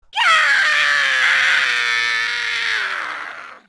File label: scream; D'Vorah